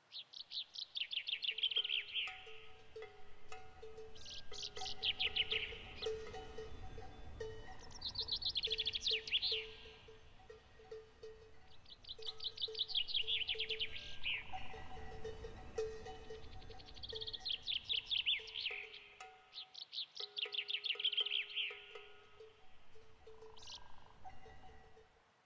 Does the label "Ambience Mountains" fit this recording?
Yes